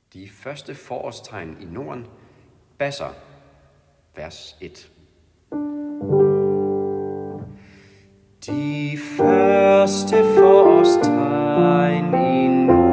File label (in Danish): De første forårstegn bas; laudate kirkens folkekor